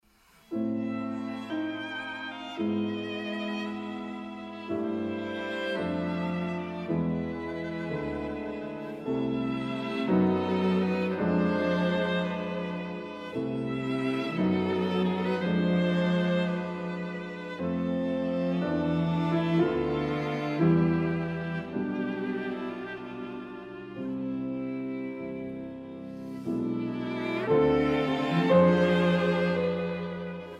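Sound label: piano quintet; goldmark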